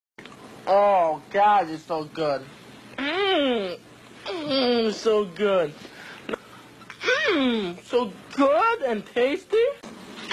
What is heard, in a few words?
hmmmm; good and tasty